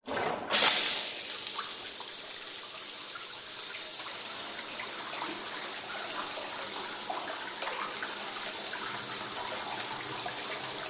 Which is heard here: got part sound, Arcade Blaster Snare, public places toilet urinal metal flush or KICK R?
public places toilet urinal metal flush